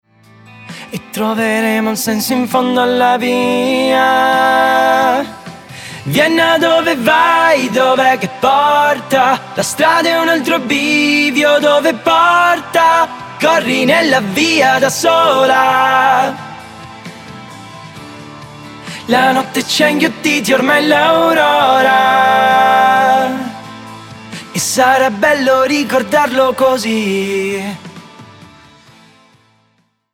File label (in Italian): VOCI ENSEMBLE